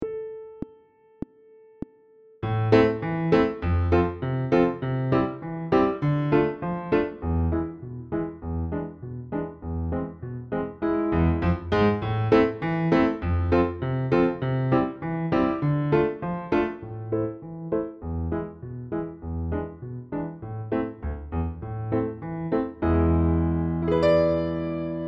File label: Piano Only; Les 1 Oefening 20